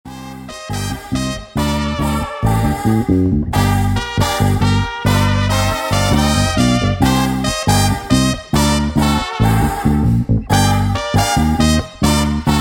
Funk sped Up

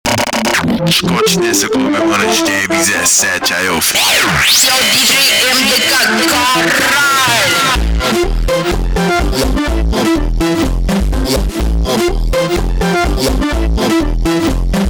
Funk slowed, funk

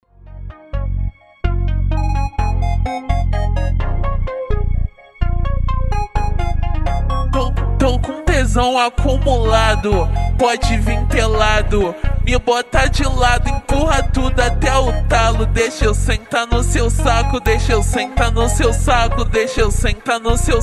Brega super Slowed, funk

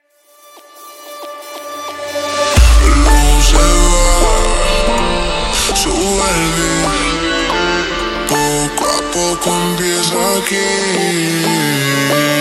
super Slowed, funk

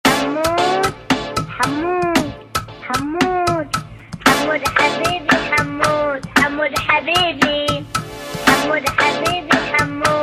Funk super Slowed

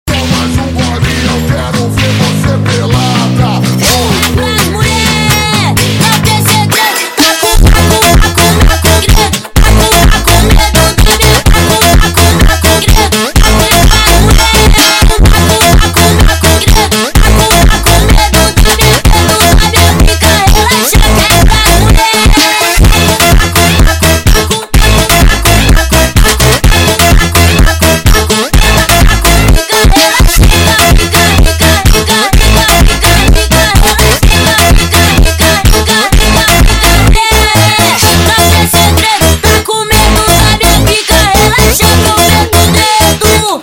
Funk nightcore

